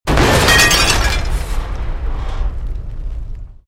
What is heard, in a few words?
Explosion Medium Metal Glass; Created explosion effects, house, building or vehicle effects; Bombs